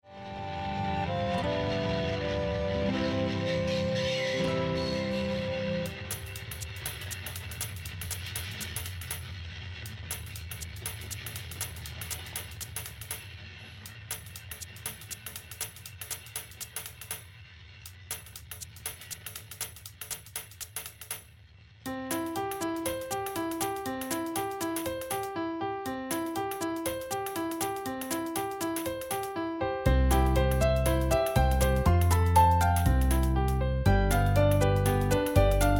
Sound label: Music Box